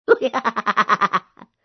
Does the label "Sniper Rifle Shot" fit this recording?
No